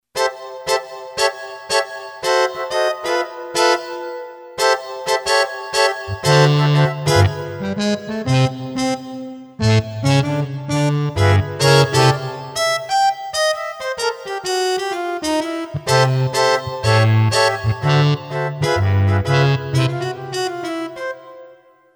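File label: Ballroom Brass; Yamaha PSR-550; Voice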